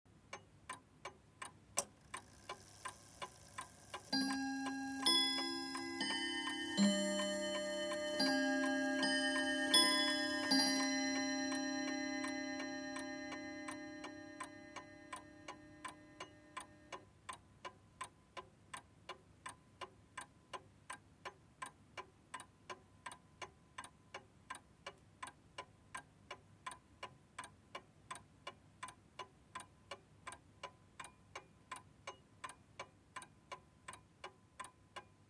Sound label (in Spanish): reloj de cuerda